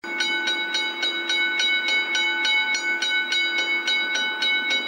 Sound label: railway barriers sms